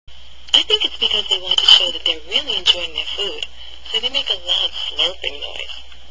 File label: slurping; advanced pronunciation